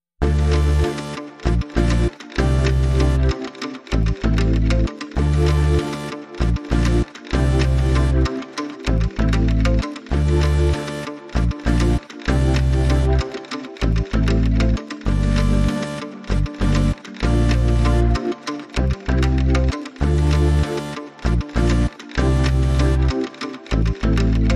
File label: Inst No Beat